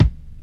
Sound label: clean kick single hit d key